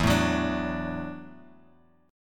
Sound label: E 9th Suspended 4th E down Bass Standard; E9sus4 strummed; JGuitar